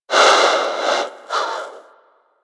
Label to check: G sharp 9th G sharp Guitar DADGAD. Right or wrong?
Wrong